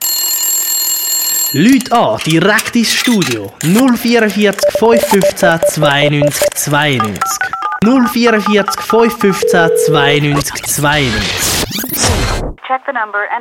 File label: Jingle Call in